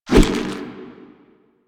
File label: Water Balloon Fire; Warframe; Water Balloons